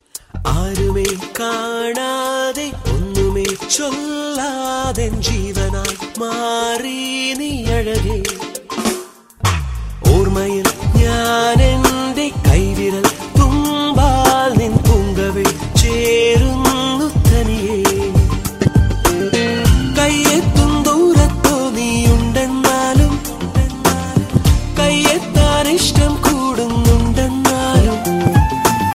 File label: amazing malayalam ringtone